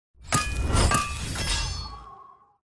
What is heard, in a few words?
fail